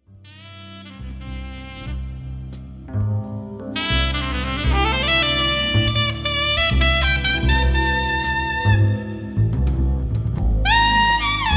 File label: Song Alto